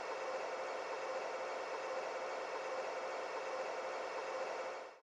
reel Spin; netent; starburst

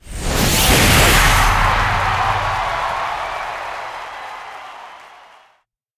bonusgame One More Pick; netent

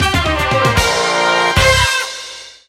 medium Win; netent; reelsteal

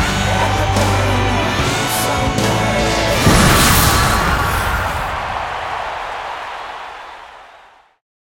Big Win End

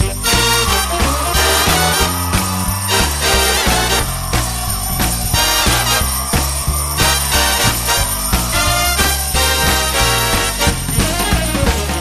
big Win Loop; netent; jackhammer

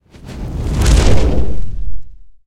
move Walking Wild Base; netent; narcos

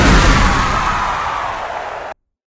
big win Txt Change; netent

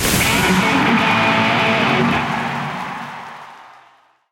bonus game guitar; netent

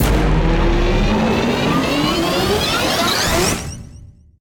near Win Riser; netent

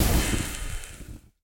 lock It Chain Flash End; netent; narcos

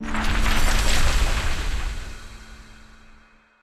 bonus Game Show All Symbols; netent; secretofthestones

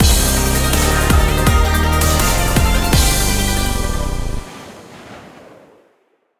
bigWinEnd; netent; butterflystaxx

